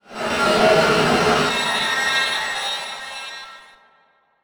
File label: Title Transition Grinding Element; Production Element